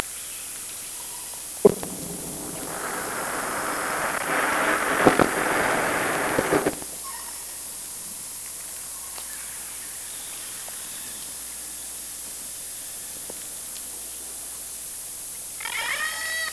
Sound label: Orca sound Night Passby